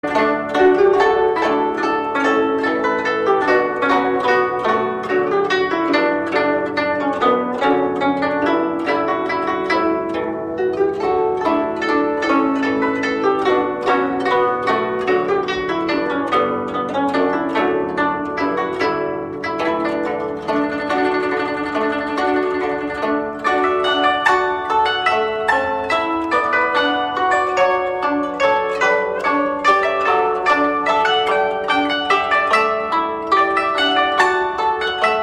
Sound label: Guzheng Cover